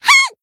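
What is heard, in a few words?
Voice attack, Japanese; ilulu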